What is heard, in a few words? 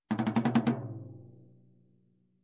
Tom Thrill Fast